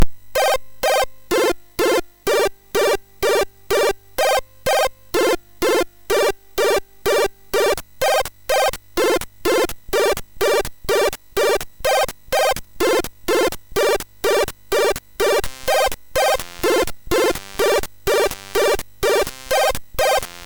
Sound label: Disco Disco, MOS6581R3